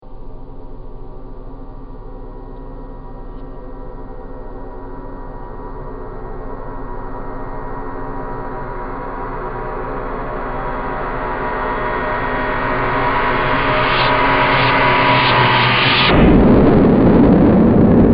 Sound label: Dramatic Impact Stinger